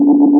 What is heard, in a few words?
bomber; Big bang